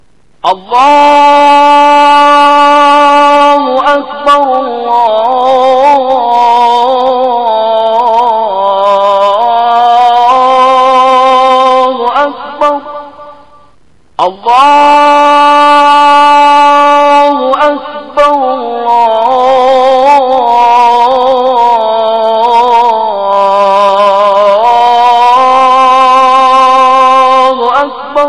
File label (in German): Minarett Muezzin; Gegen den Bau von Minaretten